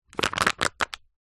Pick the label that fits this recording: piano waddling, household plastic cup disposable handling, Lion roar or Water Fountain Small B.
household plastic cup disposable handling